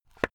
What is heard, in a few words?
watch case small close soft